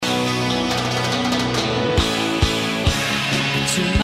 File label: Fill, Drum Sounds